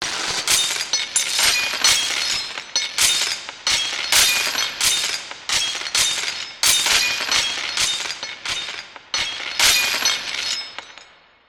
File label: chain drops; eShop